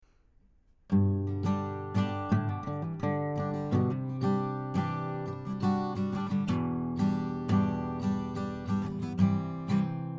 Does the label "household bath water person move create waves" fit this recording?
No